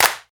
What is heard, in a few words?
dry hip hop clap single hit g key; single hits one shots